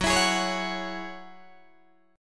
F sharp 7th Suspended 2nd F sharp down Guitar Open D